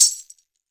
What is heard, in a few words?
MIXED PERC; 90 s Sample CDs